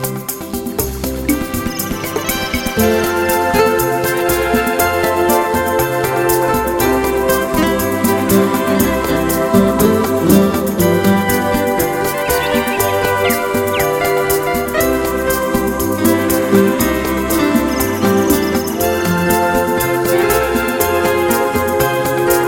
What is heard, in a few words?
Smooth and Pretty